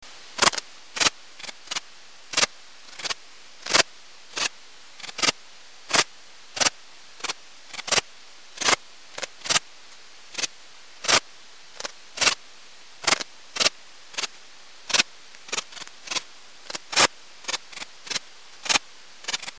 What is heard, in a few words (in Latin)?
pholidoptera griseoaptera; heuschrecken; insekt; wirbellos; biologie